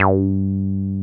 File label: 303 G3 f; Drums In Your FACE!